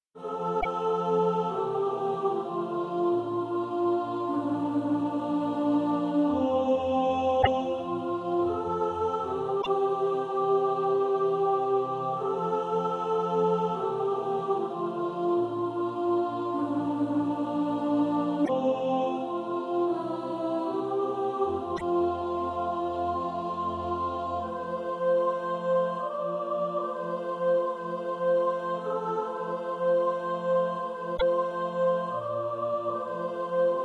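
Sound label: soprano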